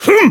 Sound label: kiai; MonsterHunter; Fight